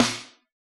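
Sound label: hip hop kit snare; Dr 202 Kit 1; Percussion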